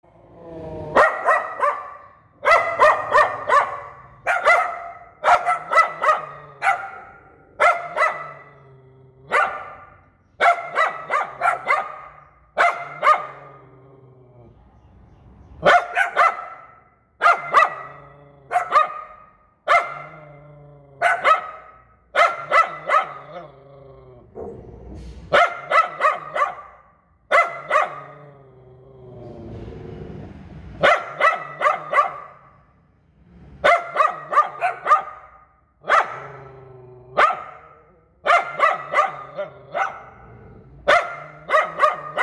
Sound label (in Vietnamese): tieng cho poodle sua keu